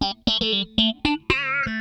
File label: FAZE PLUK, Funk Guitar; 90 s Sample CDs; Zero G